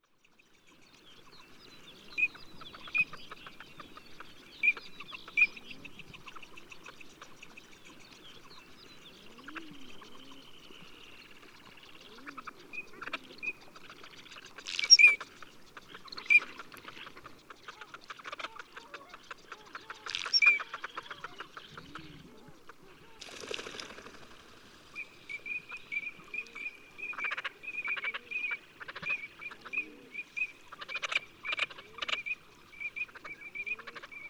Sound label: Anas crecca, Water Birds; Chordata